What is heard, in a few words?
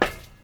Truck sprint; Phasmophobia